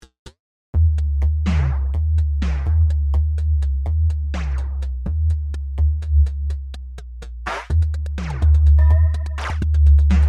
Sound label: filtered synth drums at 125bpm